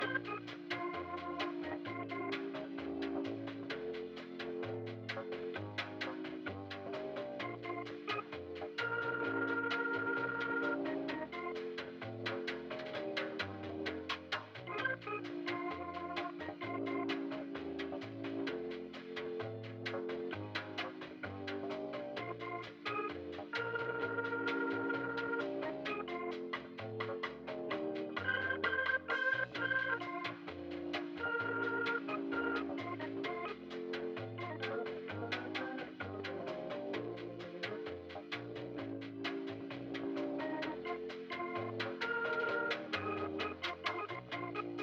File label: Store Loop